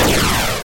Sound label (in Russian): ugasayushij ogon plamya